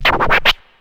Scratch, Ensoniq ASR-X Pro

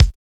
LINN 9000 K

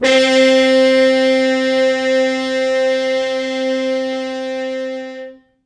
Horns stops

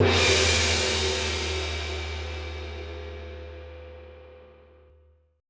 GONG CRSH, TIBET; Best Service Advanced Media Trax Modern Composer; ETH TIBET

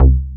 ANAL BAS C2, Bass Analog & Synth; USB Soundscan vol.12; AKAI; VINTAGE

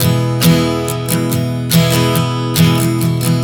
Strum 070 E, One Chord Strumming 070 bpm; Sonic Foundry (Sony Creative Software); Songwriter Acoustic Guitar Companion

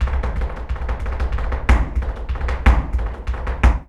Flamenco, ZAPATEADOSOL

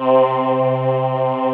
ANA CHOIR; Pro-Rec - Synthsonic; JV ANALOG

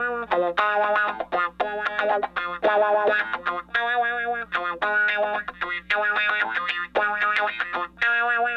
Raw Funk Guitar

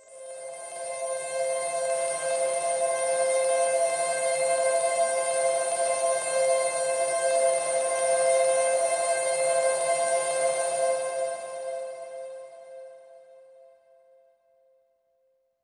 Pad C, Synth Prophet One Shots; Pads; Electronisounds

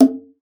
Conga, Session Instruments

